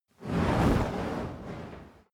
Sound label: fire torch whoosh long soft; swooshes-swishes